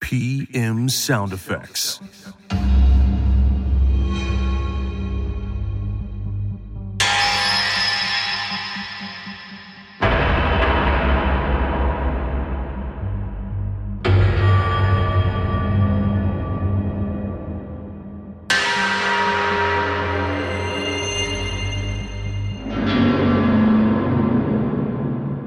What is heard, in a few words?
Industrial Terror Hits And Transitions; PMSFX